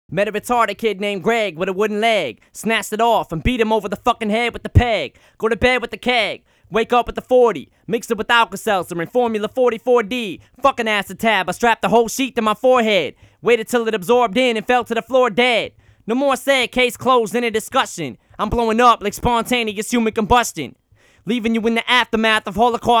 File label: Acapella Version